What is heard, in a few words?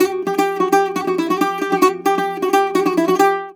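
bouzouki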